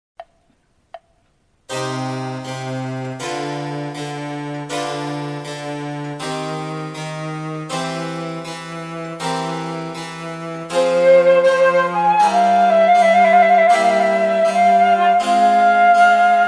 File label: bach trio sonata live rec